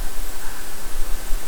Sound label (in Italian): Sospiro; Forte Belvedere